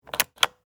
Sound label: Steampunk Mechanical, Scifi, Steampunk, Mechanical, Push, Button; Science, Fiction; BlastWave